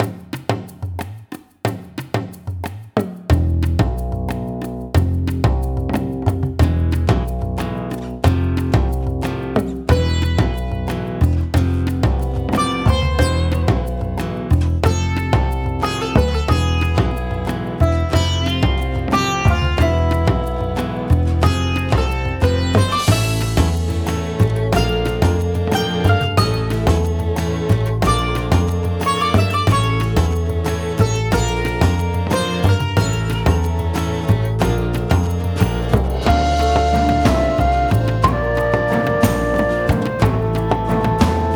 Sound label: Desert Level; epic dumpster bear; wii u